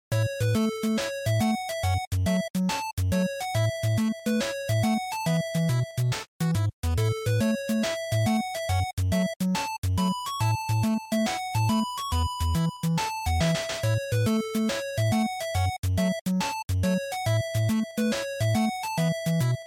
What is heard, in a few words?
NES Stage